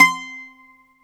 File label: BOUZ PIAN C 4